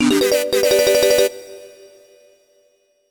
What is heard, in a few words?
Turbo Trainers (Start)